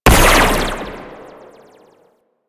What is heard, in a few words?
Laser Fire; Amalgam Carrus; Warframe; Abilities